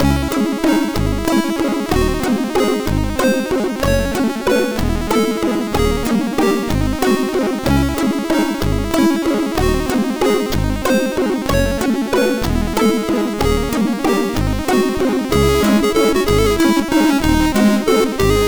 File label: sid, MOS6581R4